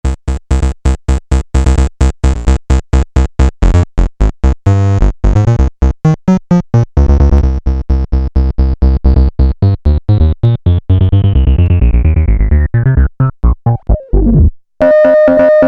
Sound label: Upright Bass Filter